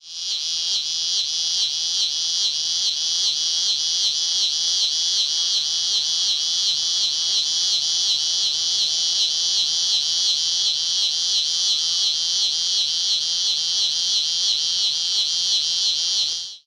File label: Cicada Song Loop